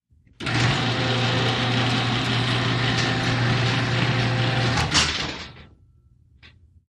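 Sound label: Garage Door Electric Open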